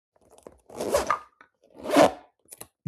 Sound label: purse